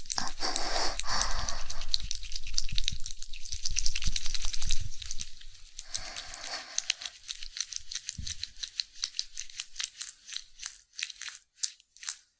寝くちゅ イキ我慢

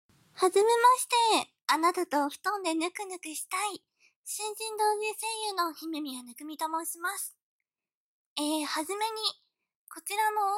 おまんこグチュグチュ音を垂れ流しながらちょいおほオナニー実演; ノイズ除去; 逝くのを3回我慢ルール

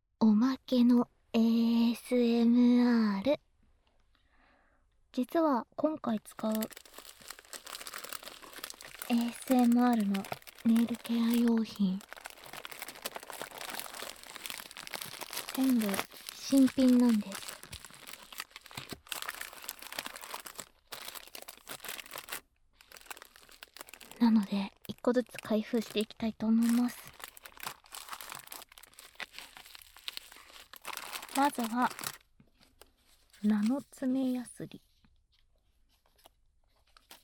反転 ASMR ネイル用品開封式; 安眠耐久ASMR

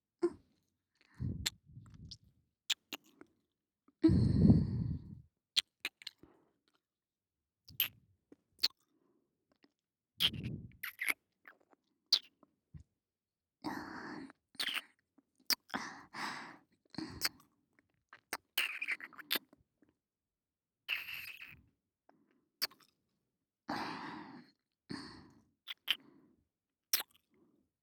左右交互耳舐め

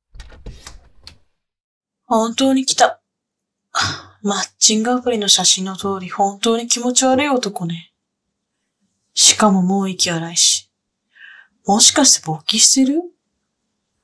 オホ声

